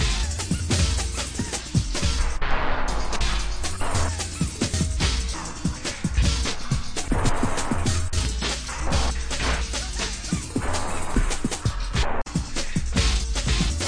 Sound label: freakout loops brownout